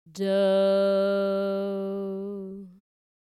sung tonic

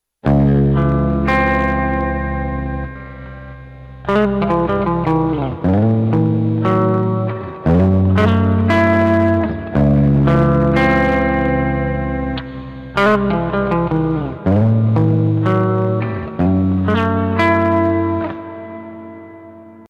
Open C Major

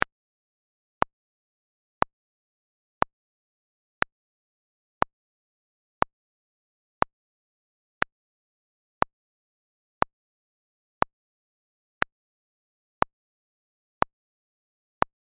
metronome

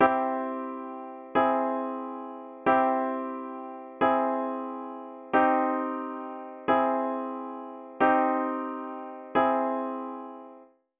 Major Minor Augmented; C major triad vs C minor triad vs C augmented chord

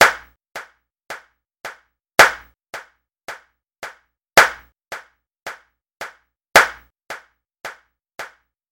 clap emphasis

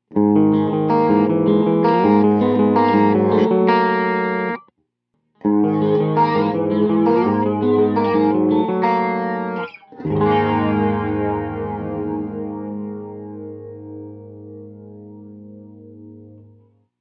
flanger

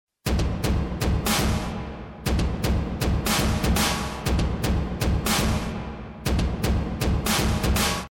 Giant Hall Reverb

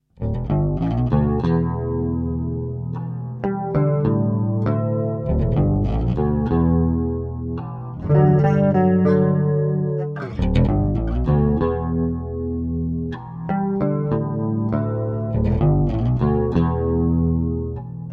chorus; bass; bass tone

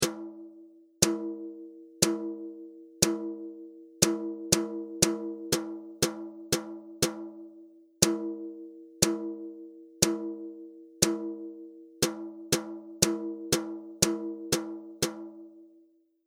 RHYTHM; traditional notation

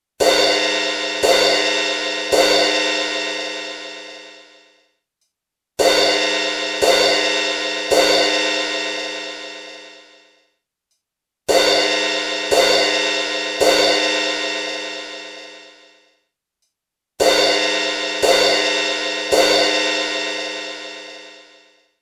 Crash 515Hz; frequency training series

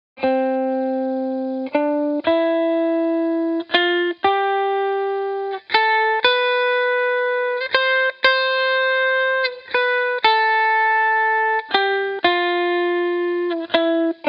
scale example

